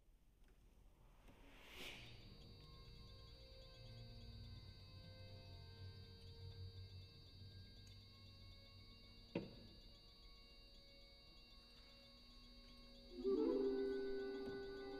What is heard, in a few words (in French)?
Pour Orchestre Debut